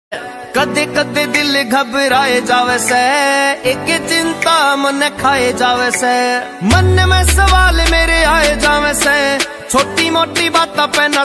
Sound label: haryanvi ringtone